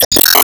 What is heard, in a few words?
Enemy Attack Shot